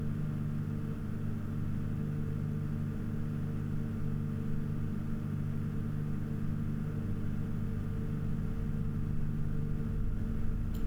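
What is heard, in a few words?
1500 MAX RPM, fan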